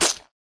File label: eat leaves; koala seasons; raylib